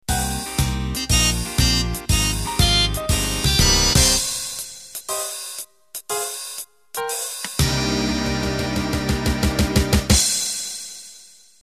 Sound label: Fox Trot End